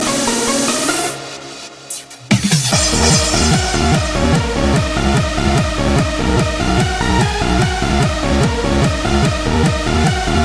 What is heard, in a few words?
Fast Paste Beat